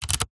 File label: vehicles car fan speed dial turn clicks toyota rav4